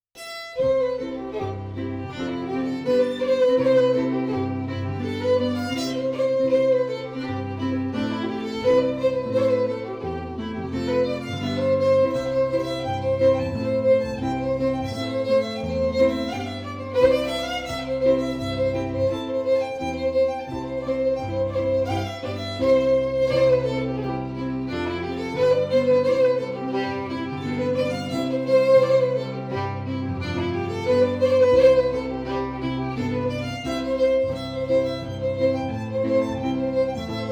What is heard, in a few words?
FIDDLE PIANO; Jink & Diddle Book o' Tunes 2021